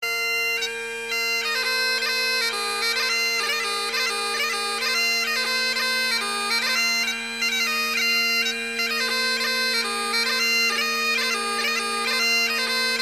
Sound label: Bagpipes Warpipes